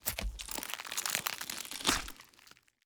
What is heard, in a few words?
skin tear